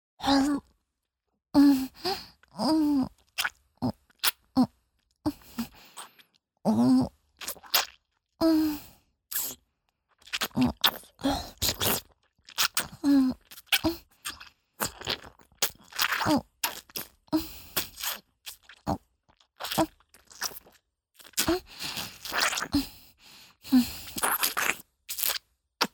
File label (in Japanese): フェラチオ(弱):陰茎を口内に含んだまま舌をうごめかせる; 実用ボイス抜粋集